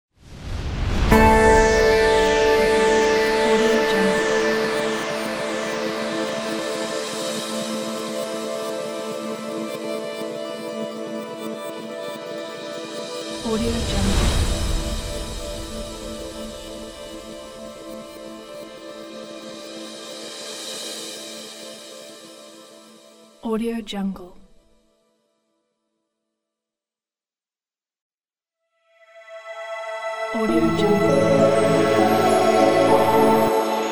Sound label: ambient logo pack